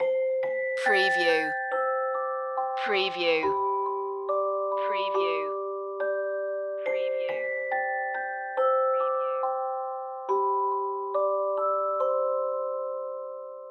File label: lullaby beat loop